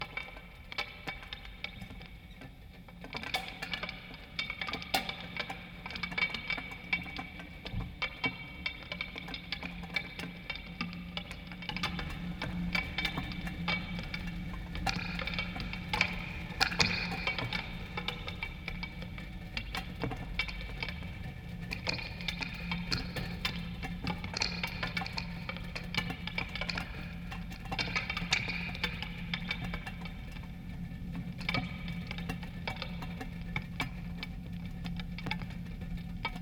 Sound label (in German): Aufnahme 12 Kanal stereo Fieldrecording; Vocoder Mix live, neues Set-Up; Das kleine Regen Rhythmus Orchester